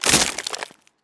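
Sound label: Ice Pop Break; Ice Sta Pop Breack1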